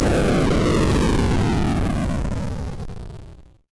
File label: Boss Death